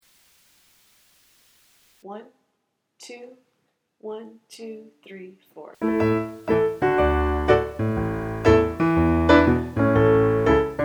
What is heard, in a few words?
Piano listening exercise At Tempo